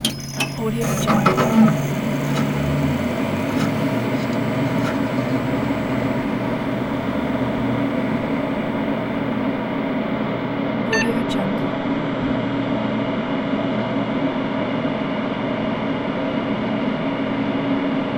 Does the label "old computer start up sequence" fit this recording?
Yes